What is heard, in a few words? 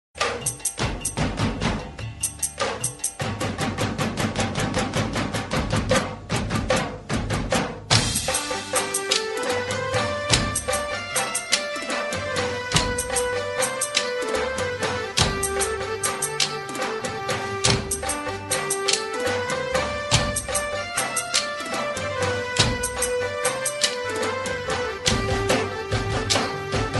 navratri rhythm